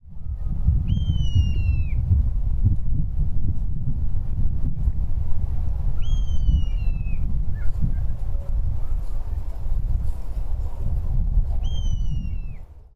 nosuri

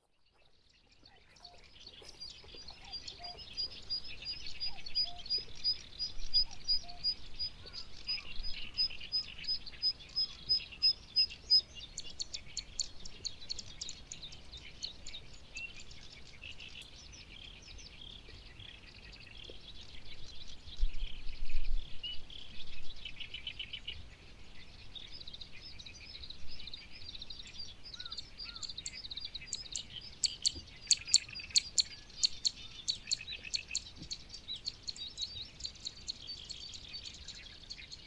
セッカ; 渡良瀬

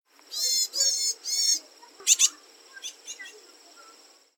yamagara

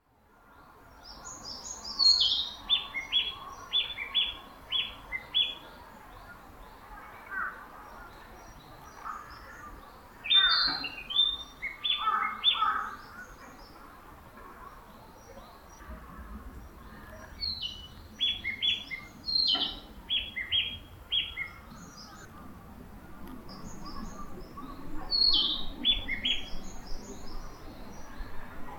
kibitaki

